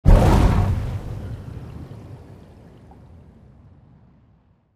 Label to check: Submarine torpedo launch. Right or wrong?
Right